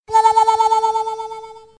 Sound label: harmonica